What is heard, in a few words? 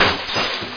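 GLASS HEAVY; duke3d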